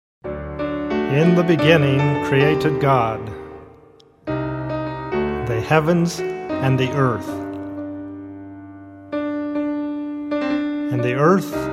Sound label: Chant